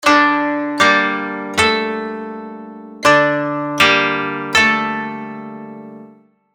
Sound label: koto